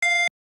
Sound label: dial tone